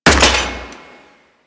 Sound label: Sniper Rifle Mech; Warframe; Tenno